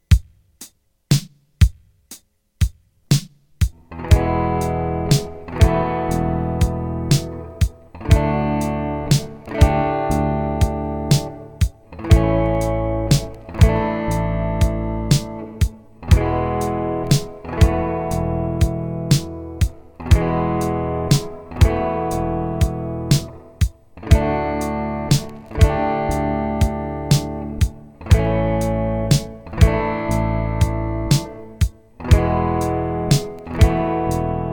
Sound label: E D A E Sus 4